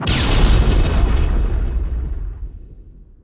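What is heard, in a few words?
Ambience Explosion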